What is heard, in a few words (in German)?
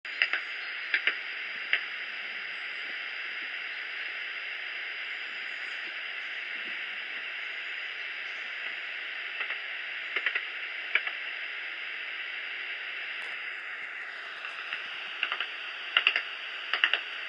Gesangs; ELSA